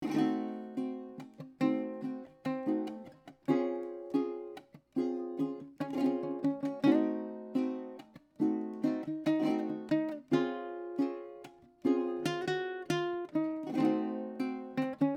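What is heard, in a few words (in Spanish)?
C sharp Locrio Ejemplo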